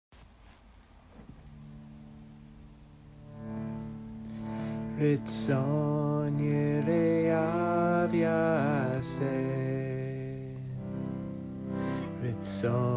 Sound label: reish chant